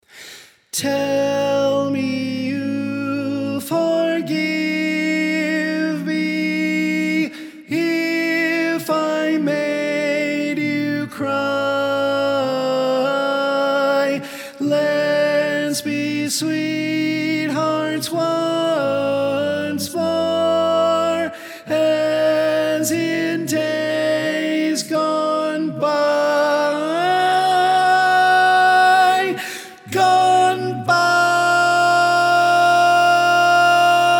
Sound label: Tenor